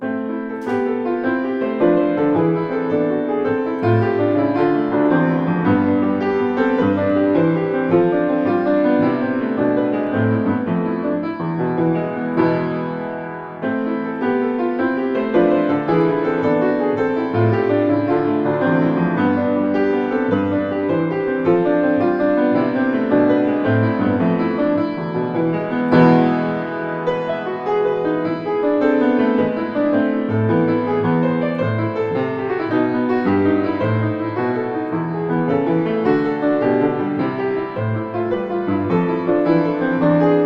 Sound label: Bluethner Gigue